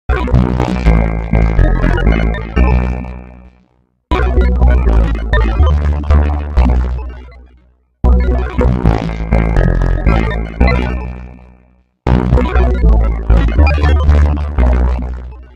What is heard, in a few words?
Bass; Bass Cat; Plasma Island